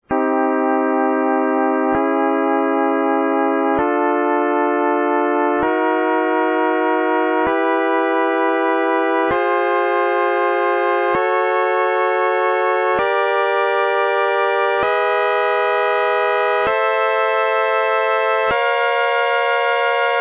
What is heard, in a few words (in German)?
Drittel Mitt Moll; Tonsystem; mitteltonige stimmung